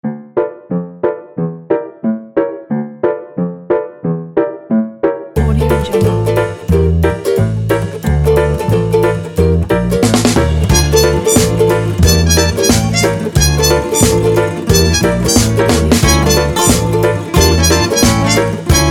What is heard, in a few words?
a moment of surprise ident